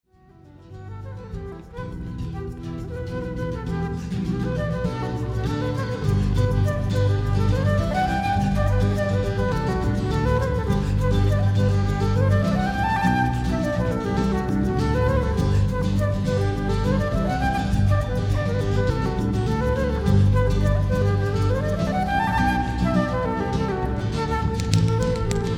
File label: Celtic Flute; Bagpipes